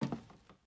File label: Footstep Wood